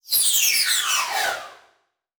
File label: Title Transition Power Down; Production Element